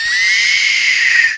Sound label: SCREAM; Shadow